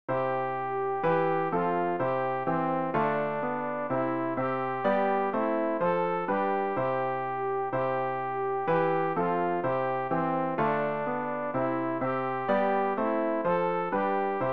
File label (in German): alt, Übehilfen für das Erlernen von Liedern; ref gesangbuch; rg 278 ich weiss woran ich glaube